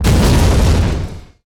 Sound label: Explo Metal Cannon Destroyed; Destruction